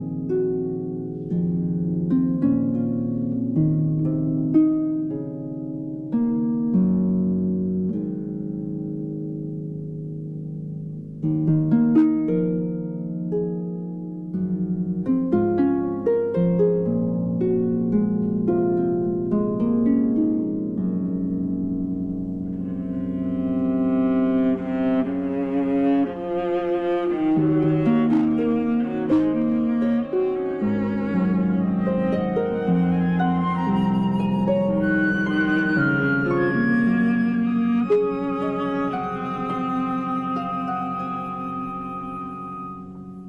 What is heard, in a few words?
Harp Concerto Lullaby